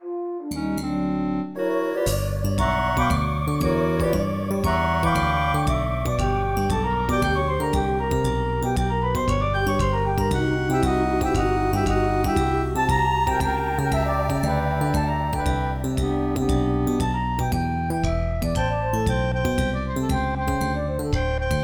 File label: hard blue; 3800 MIDI Magic